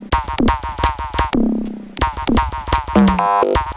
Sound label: Glitchit Top C# 127bpm, House Drumloops; 90 s Sample CDs; Zero-G House Fabrik Drumloops; ZG Drumloops